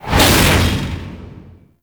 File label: Heavy Hammer Whoosh